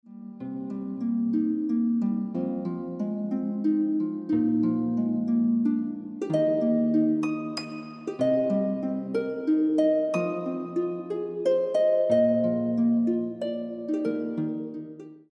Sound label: Lullaby Accompaniment